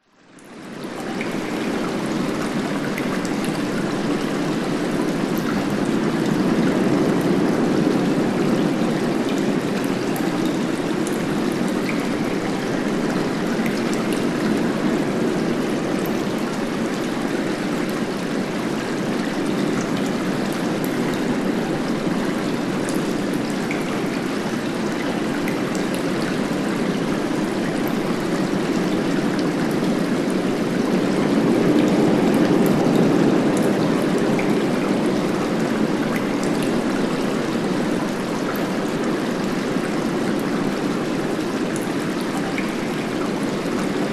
nature cave ambience water running light drips wind outside